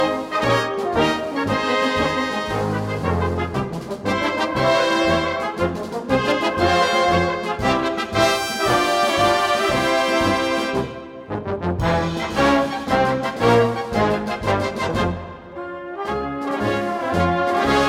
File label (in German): Marsch